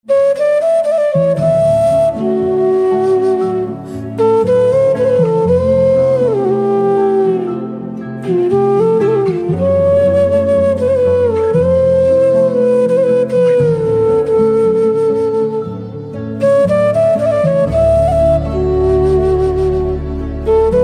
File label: Flute